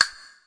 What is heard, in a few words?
CASTANETS, Roland TD 7; DRUM MACHINES